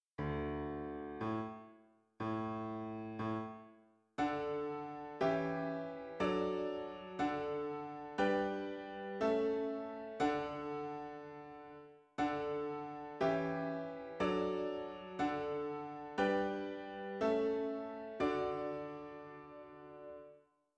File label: Piano